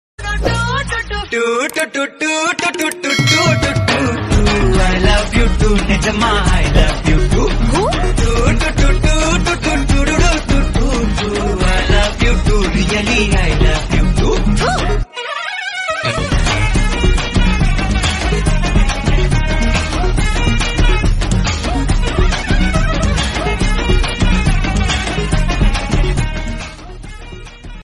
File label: tamil song ringtone; kaathuvaakula rendu kaadhal